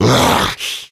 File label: controller hit